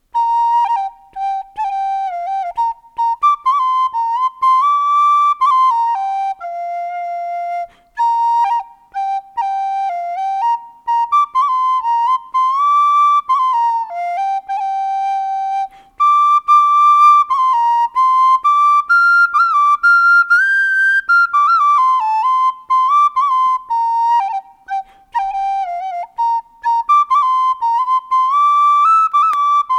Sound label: Recorder